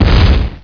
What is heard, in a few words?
stomp